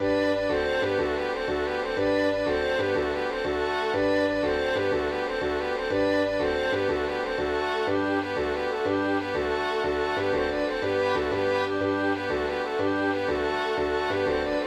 polka, 3 voix

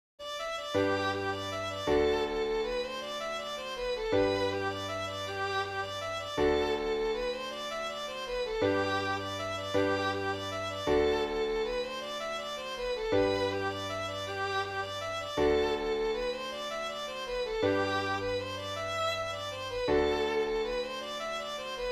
mazurka

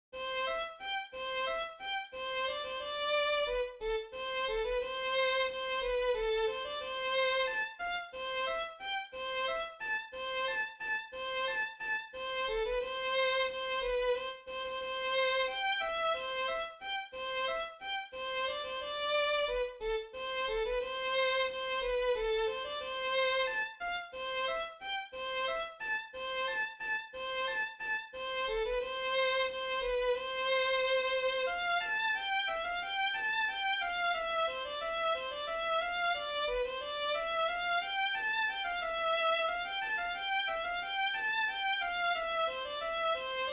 fandango violon; betelu

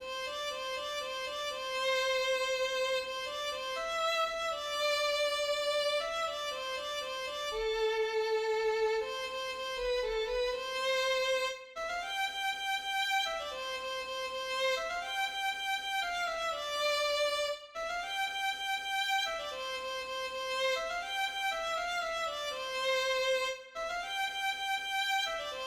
valse, voix1

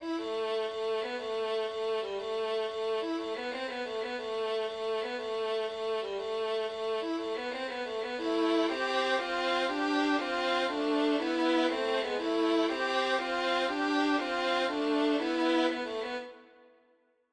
violon

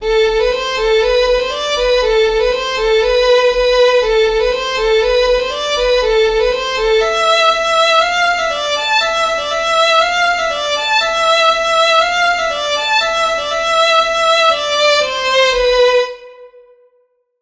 polka, do voix1

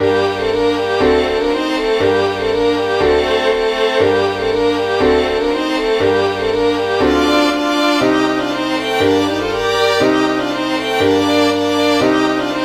polka, sol

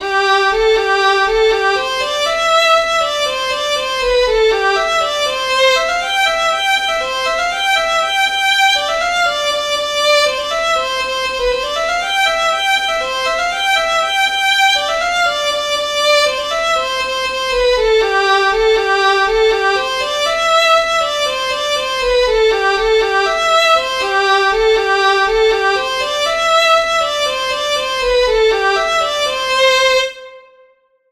bourree; bourree des grandes poteries

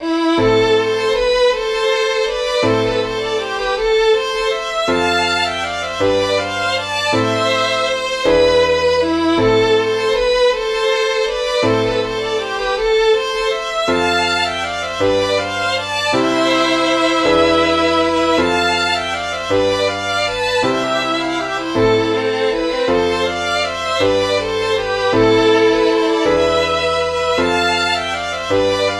valse

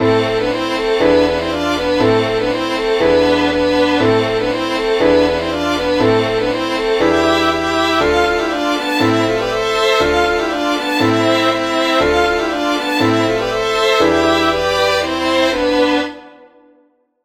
polka, do

